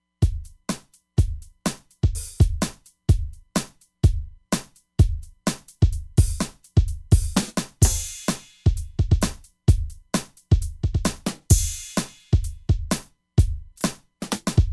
HiFi R&B Kit, Roland Fantom XR Drums

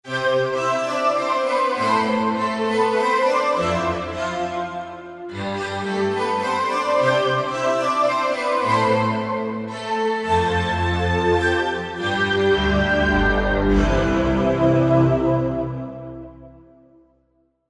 2 voices; Korg MSC-12S Synth 3

